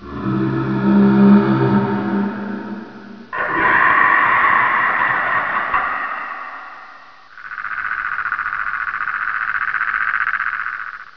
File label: creepy creatures